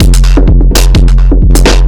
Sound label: Beat, Drums, Eclectic Electronica